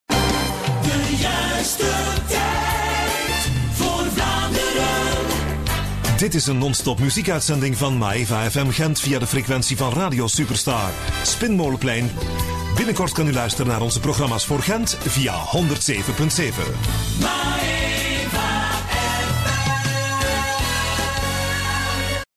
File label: jingle; Maeva